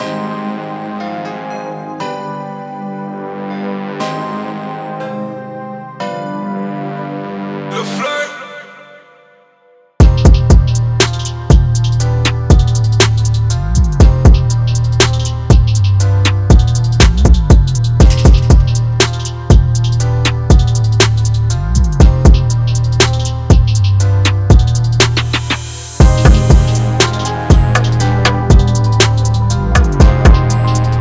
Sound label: beat